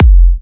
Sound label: Kicks F; markus hakala